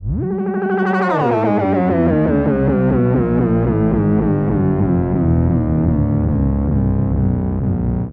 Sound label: pitched, Feedback; Feedback FX; 99Sounds; Dark Engine